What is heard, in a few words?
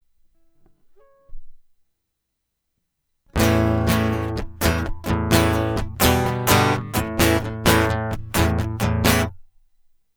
Guitar Loop